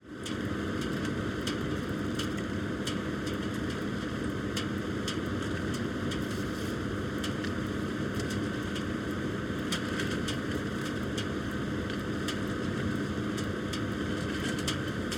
Ski Lift Station Ambience; Ski Ride

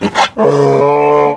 idle, zombie poison; hl2

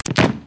wall hit, headcrab poison; hl2

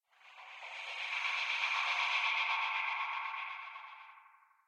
Soft Air Passby; Transitions; L.A. Sounds

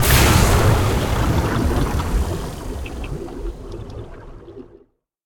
Magic Spells Cast Long Combo Water Combo; David Dumais Audio

